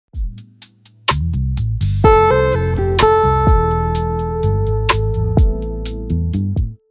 Hammer Ons and Pull Offs, guitar

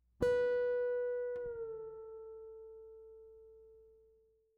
pre bend and release, guitar; guitar string bending

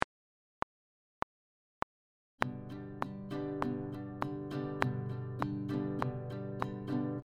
Easy strumming pattern, guitar; Strumming pattern 1; Strumming pattern 1 audio

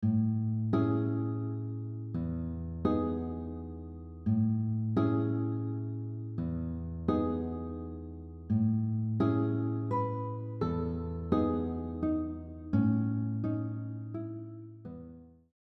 guitar; Gymnopedie No. 1; Erik Satie

